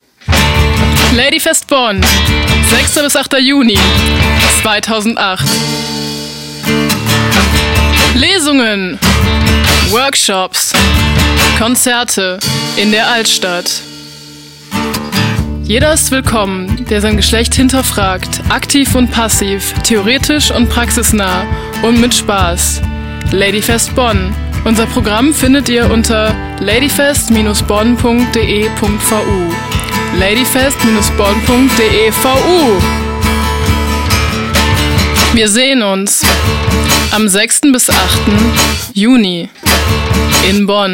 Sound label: jingle; ladyfest